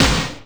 TURBO SN

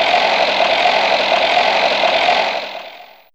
Noise 1 F4, Fairlight CMI IIe; Effects3

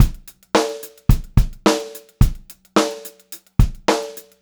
POP BEAT, Pop-Rock Drumloops; 108 POP

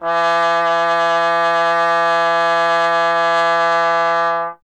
Trombone E; Miroslav Vitous - Solo Instruments VOL-1

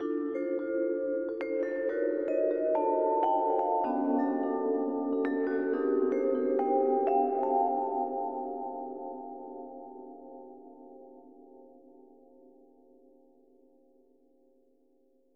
125 ambient vibes, Keys, Nu Jazz House; PowerFX - Nu Jazz House

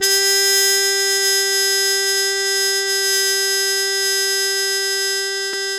MUTE G1, Mood Trumpet; E-MU Formula 4000 Series Vol. 1; Hip Hop Nation

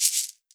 shek, WORLD PERCUSSION; Best Service ProSamples vol.52 - World Instruments 2; MIXED PERCUSSION

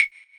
Wood, Block Stick; Equipped Music; Loungin House

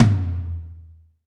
DM5 Tom M, Alesis DM5 drum machine

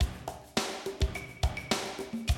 Classic DrumLoops

